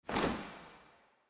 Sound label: Curtain Open; slots; Valentine Blast